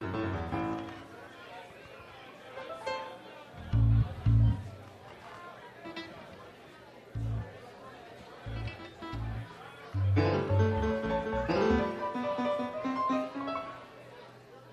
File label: tuning intro